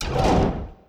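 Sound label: construction progress, ui; Stellaris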